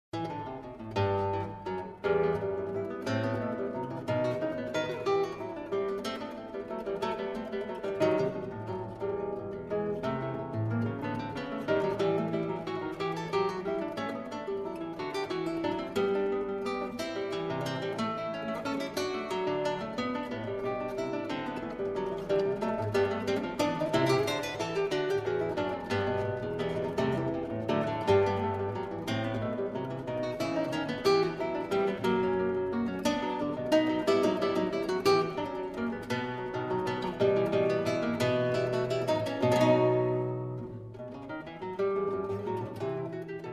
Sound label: Suite in E Minor, Gigue; BWV 996